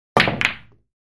Billiards Cue Ball H

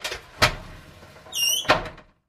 Oven Open Door; appliances

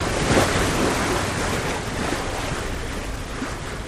Water Splash Off, bow wash

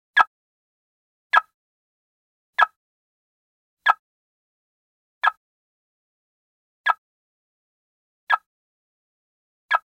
Car Alarm Activate De; car alarms

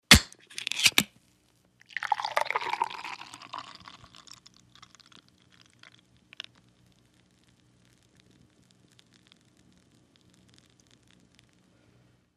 Beer Can Pull Tab Opn, eating & drinking

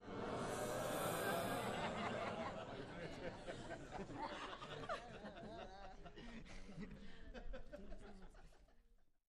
Crowd Boos Hisses, small int

